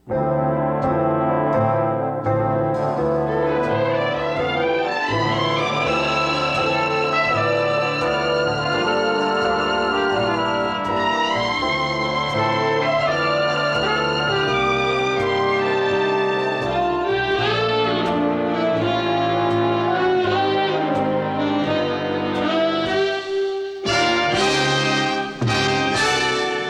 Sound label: end music